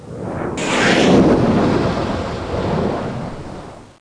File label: Landing; The Cyberplasm Formula; Sanctuary Woods; More Fun!